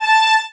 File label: violin